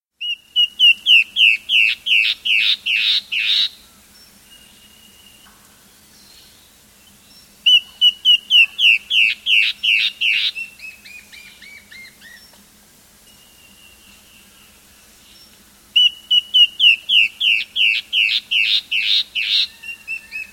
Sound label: Warbling Antbird; S. America